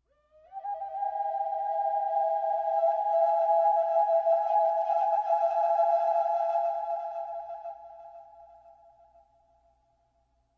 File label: verby bamboo flute; Flutes